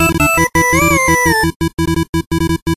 8 Bits

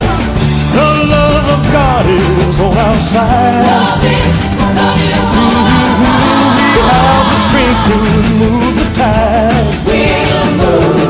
Pray together

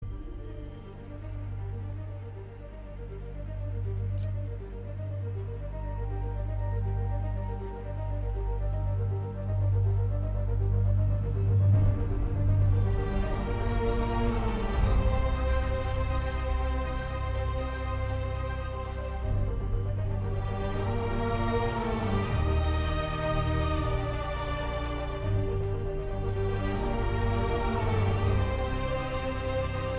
Spiderman ps4 music

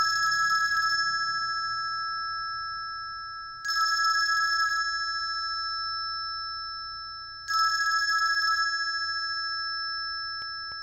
Old phone

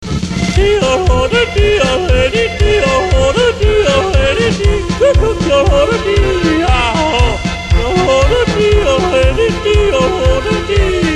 Jodle, Denmark

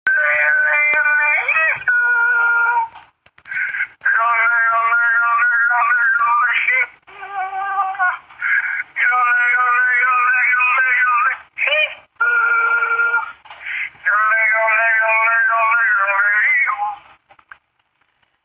yodeling

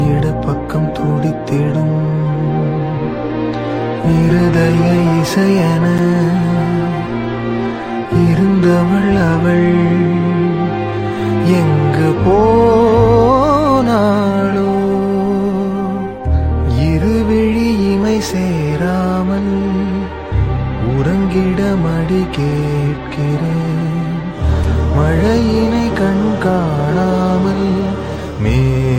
tamil sad

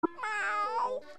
Meow

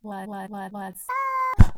Text Tone

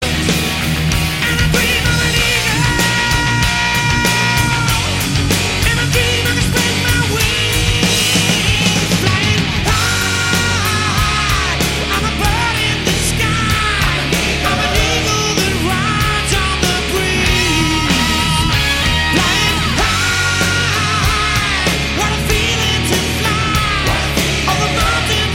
metal

